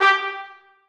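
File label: trumpets staccato g3; Sonatina Symphonic Orchestra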